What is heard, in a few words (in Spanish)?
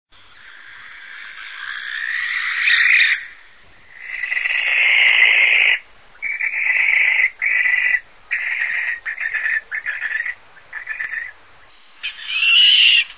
canto lechuza común, aves campo